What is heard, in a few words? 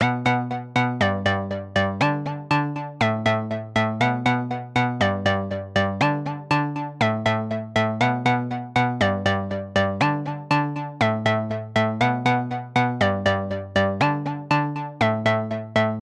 Futuristic Ringtone